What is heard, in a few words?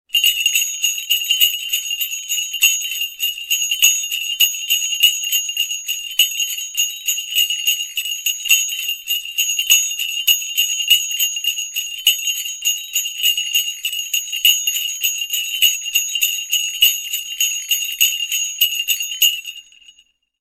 slight bells ringtone